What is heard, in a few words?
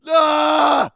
Screaming